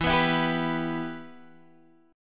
G 6th E

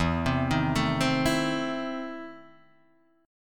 E Major 7th Suspended 4th Sharp 5th; EM7sus4#5 Chord {0 3 1 2 1 0}; arpeggiated

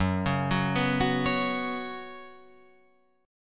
F sharp Suspended 4th, Guitar, Open F; arpeggiated; 6,8,6,6,9,8

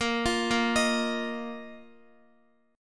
Bb Suspended 4th Bb down Guitar; Bbsus4 arpeggiated

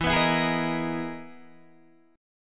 E Major Sharp 11th E, Guitar, Standard; strummed; 12,14,14,13,11,11; capo=11

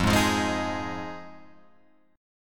F Minor 7th F Guitar Open F; strummed; JGuitar

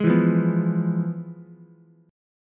F Minor Major 7th Flat 5th Ukulele

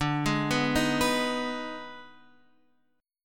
D sharp Major 7th Suspended 4th Sharp 5th D

